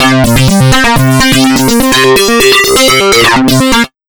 lead, Electro 125; Big Beat Drumloops; USB Soundscan vol.25; AKAI

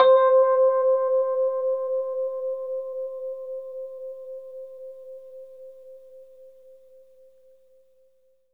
Rhodes fast C; 3D Sound; 3D Keys VOL-1

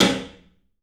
CLUB SNARE; 3D Sound; 3D Drums

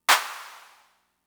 Clap; Fricke MFB-522

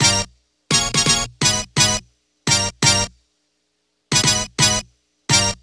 HIT, Hip Hop and R&B Drumloops; Best Service ProSamples vol.01; AKAI

